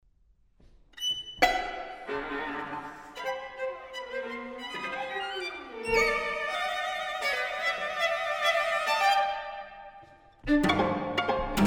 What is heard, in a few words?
String Quartet; György Kurtág - String Quartet, Op. 1 Movement 1